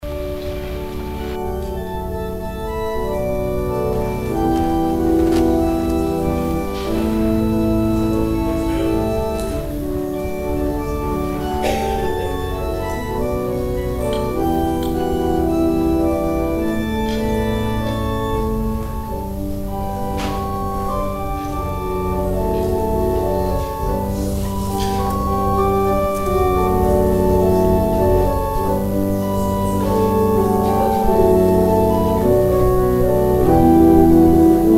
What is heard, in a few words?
organ interlude; Interlude during the offering